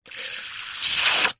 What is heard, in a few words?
foley balloon pump in water single spray out; zapsplat